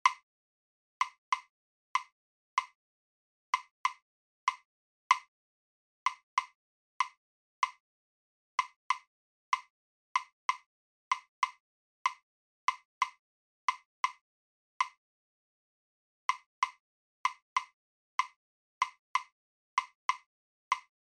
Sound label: Tango Rhythm